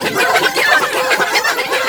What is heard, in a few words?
RITUAL; Spectrasonics; Heart of Africa 1; RITUALS